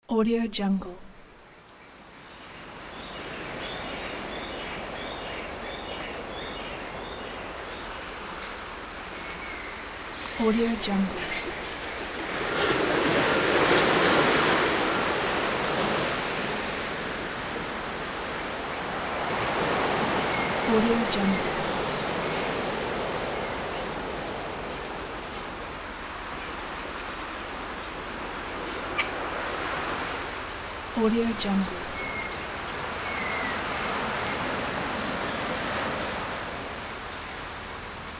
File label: beach ocean wind